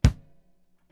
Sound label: Kick, Trap